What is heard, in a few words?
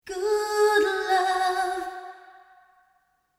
vocal highs